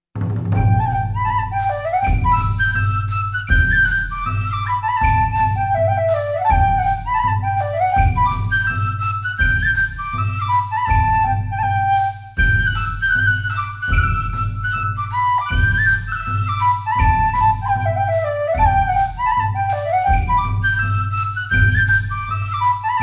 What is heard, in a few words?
penny whistle